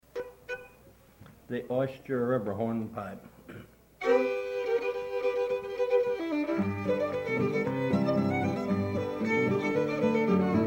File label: Hornpipe; Oyster River Hornpipe; greatlakes; lotus